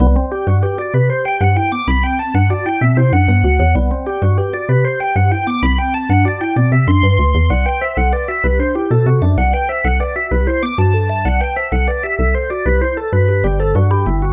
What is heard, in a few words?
jig